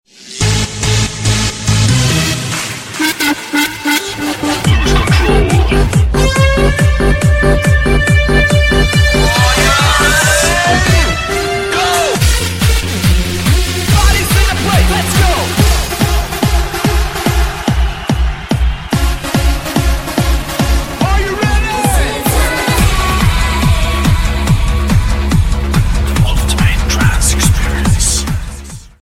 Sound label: ultimate dj ringtone